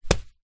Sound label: leisure juggling bean bag small land on carpet; zapsplat